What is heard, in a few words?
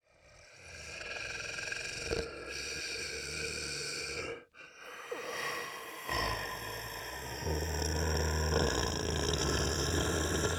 Horror Monster Snoring